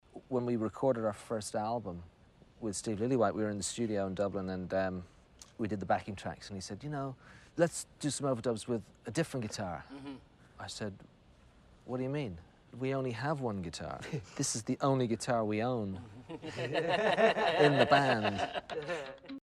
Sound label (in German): einzige Gitarre; it might get loud